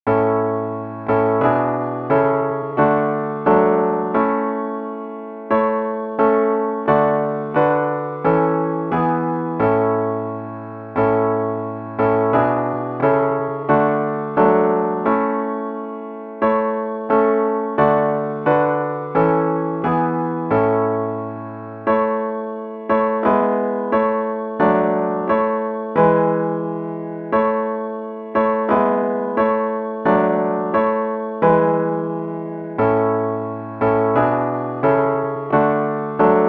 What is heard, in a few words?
SPANISH HYMN